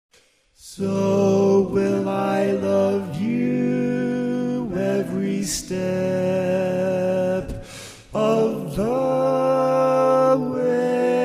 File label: Bari, Barbershop